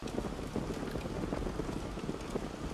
fire Loop; netent; wishmasteroct

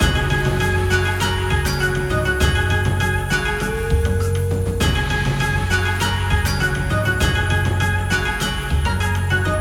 big Win Loop; netent; longpao

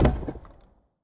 block Block; netent; pyramid

